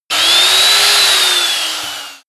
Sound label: sander free short